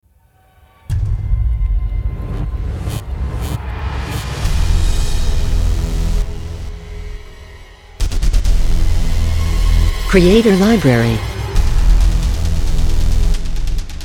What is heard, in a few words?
Action Choir Chase, cinematic music